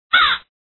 bird

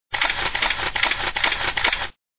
camera

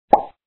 pop

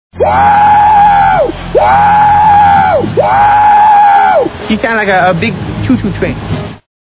choo choo

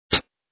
hihat

